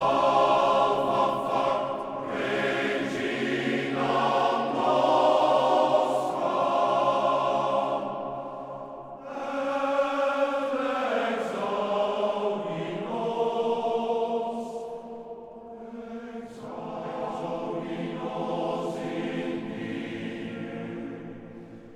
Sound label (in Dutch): Koor (mannen); Mastreechter Staar